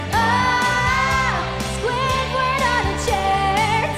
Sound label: Singing; IRySinging